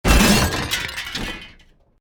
CRASH VEHICLE LARGE DEBRIS GLASS, vehicle accident sounds; A series of vehicle accident sounds created using FMOD; Crashes